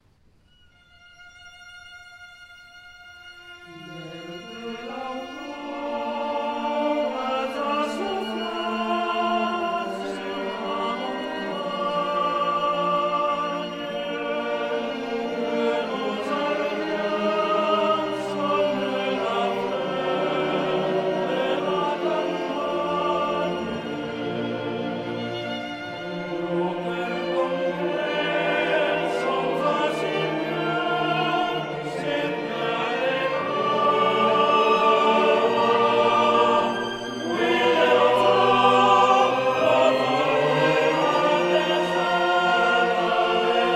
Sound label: male Choir